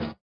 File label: SWUSH; Spectrasonics; Metamorphosis